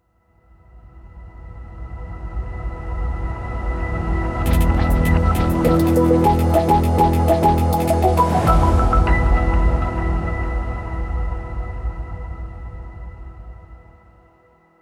Corporate Logo; Stray

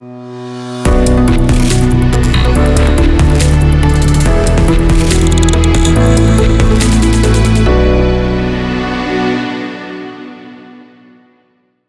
Ambient Technology Logo

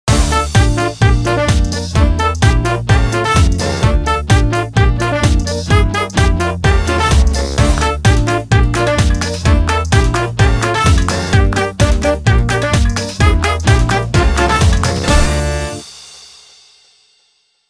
Cooking Show Logo

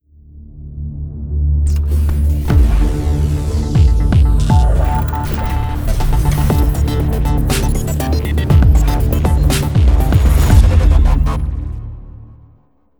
Robotic Logo

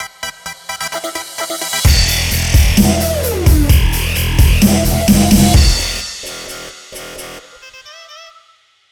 Dubstep Logo Wave

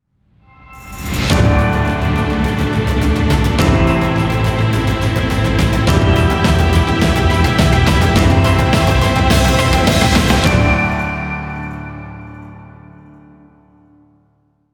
Epic Inspiring Logo

